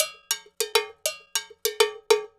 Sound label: Cow Bell, Latin Percussion; Sonic Foundry (Sony Creative Software); Joe Vitale Latin Percussion